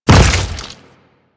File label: Step Goo, Footsteps; Warframe; Grineer